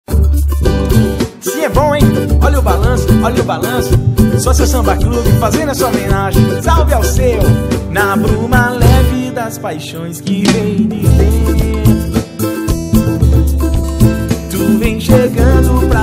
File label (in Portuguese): Samba; Clube Anunciacao